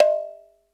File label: PERC